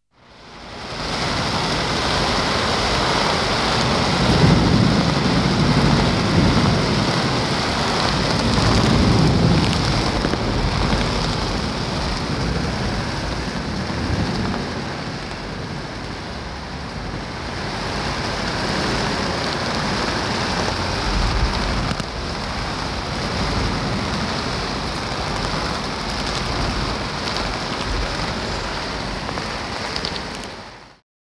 Thunder Storm At Night; Halloween Sound Effects

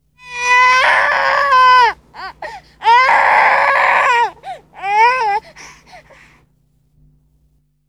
Baby Crying; Halloween Sound Effects; Rosemary's Baby

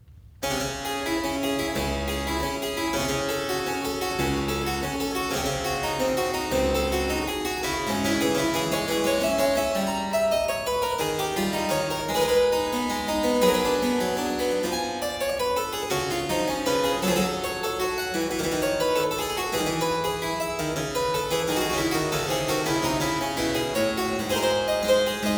Praeambulum in D minor, Keyboard Works; J.S. Bach; BWV 926; Johann Sebastian Bach; Inventionen & Sinfonias

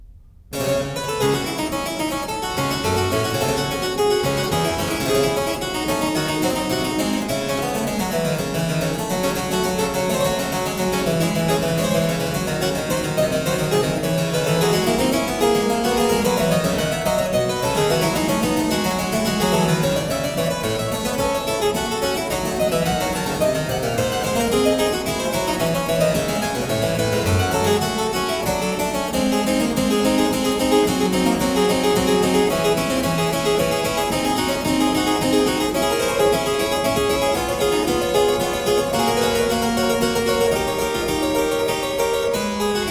Prelude & Fuge in D minor, Keyboard; No.6; BWV 875; Johann Sebastian Bach; The Well-Tempered Clavier

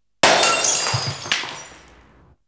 Glass Breaking; Halloween Sound Effects

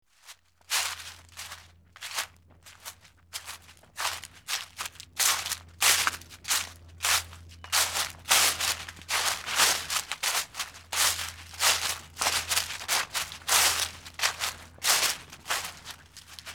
Walking On Leaves; Halloween Sound Effects

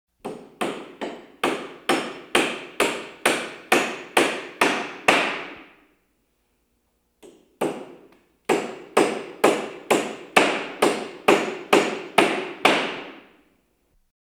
Hammering Nails Into A Coffin; Halloween Sound Effects

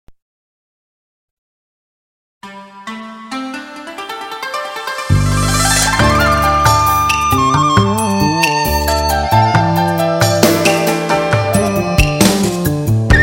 C-Pop Hits

